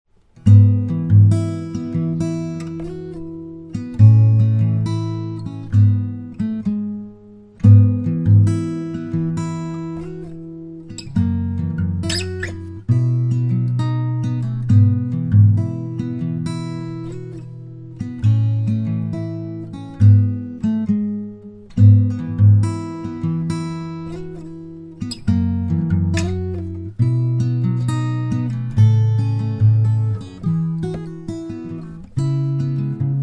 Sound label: Acoustic Instrumental